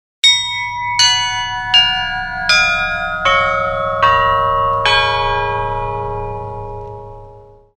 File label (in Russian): 7 kolokolov; Beloz